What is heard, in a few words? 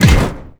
biomech walk hard